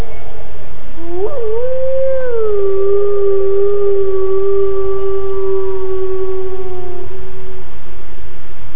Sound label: modulated howl